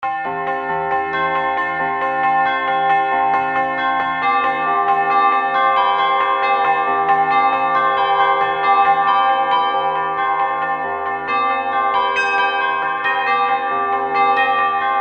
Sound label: bells; bell tuning compare; raven